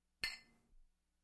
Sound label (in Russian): odinochnyj zvon stakanov